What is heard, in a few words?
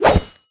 auto swing; pirate; Smite; Sobek